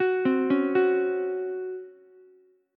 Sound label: C sharp Major 7th Suspended 4th C sharp Ukulele; arpeggiated; chord; JGuitar